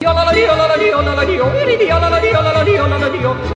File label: jodel; torchlight